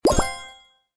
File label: prize effect